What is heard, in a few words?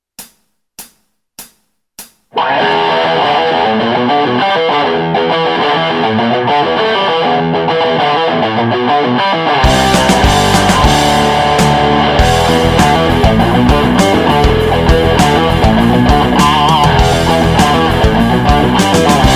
rock